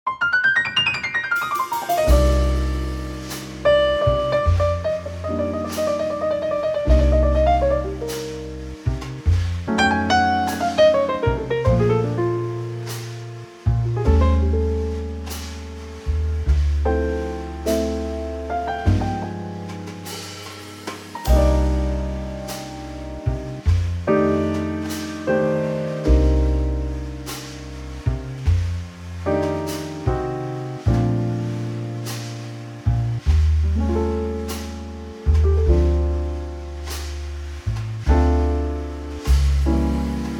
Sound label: Trio; key - Bb - vocal range - E to G